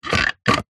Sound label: household vacuum cleaner plastic power button large press